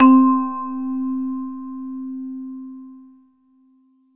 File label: Vibraphone C3; Mallets